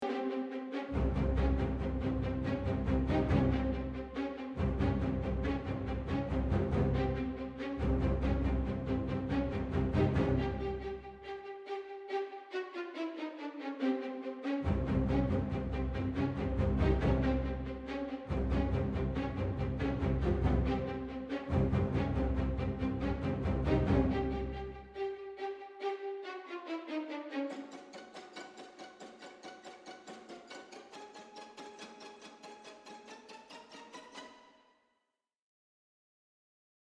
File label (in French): Poursuite LOOP; From the Shadows